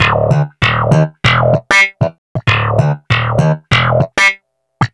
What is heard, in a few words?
Wah Snap in E, Snap Bass; Basses